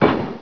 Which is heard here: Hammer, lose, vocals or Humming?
Hammer